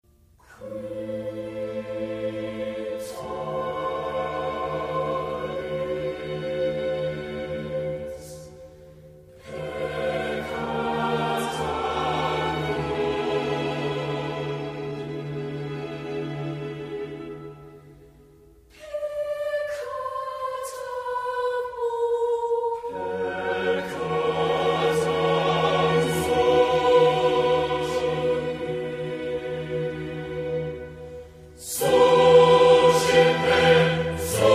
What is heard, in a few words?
Choir, Gloria, Qui tollis pecca; Vivaldi